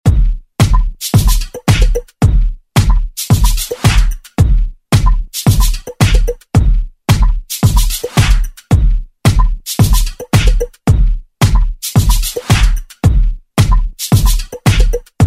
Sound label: 80s Ext Redrum Clean